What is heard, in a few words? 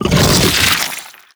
bite attack, barnacle creatures; Half Life Alyx